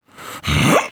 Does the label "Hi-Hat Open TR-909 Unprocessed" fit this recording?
No